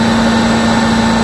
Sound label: diesel turbo loop, vehicles; hl2